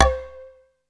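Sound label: PERC 3 C4, percussion; SEQ AND PERC; Music Store - Claudius Diemeter Schwerlos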